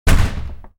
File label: wood door kick